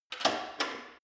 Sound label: gym bicycle saddle adjust lock; sport freizeit; fitnessstudio